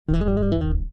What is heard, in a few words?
Egypcian bass